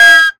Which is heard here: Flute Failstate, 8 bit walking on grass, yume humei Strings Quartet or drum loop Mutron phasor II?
Flute Failstate